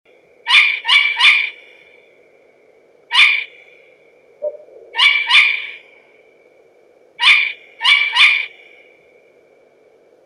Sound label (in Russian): zvuki prirodi sova ushastaya asio otus trevoga